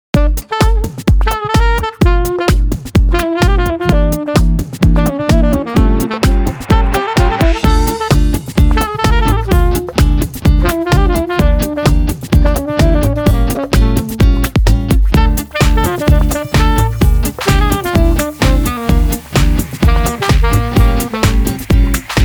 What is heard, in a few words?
THE CHARLESTON SAX JAM